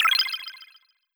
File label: button cancel